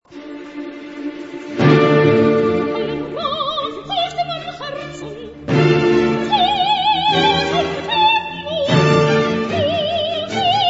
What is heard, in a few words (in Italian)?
aria flauto magico